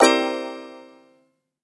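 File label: quiz sounds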